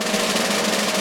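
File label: Snare Roll; Digital Sound Factory Virtuoso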